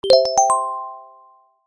smile ringtone

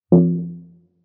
muffled, muffled tone; soft subtle ringtones

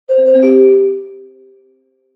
got it done, notification sounds

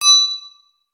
hit, message tones

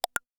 intuition; notification sounds

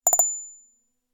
relentless, message tones

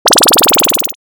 angry laser; sound effects

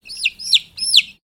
little chicken; animals

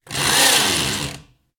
hammer drill; motor; everyday objects

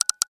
your turn, message tones

